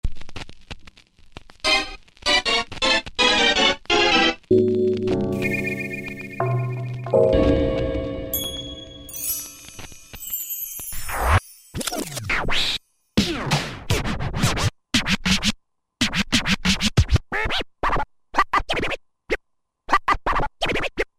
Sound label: Hip Hop Hits, Korg TR-Rack